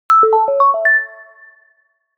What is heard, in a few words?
ui classic feedback positive jingle